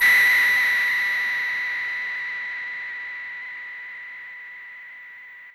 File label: SYNTH; Sentinel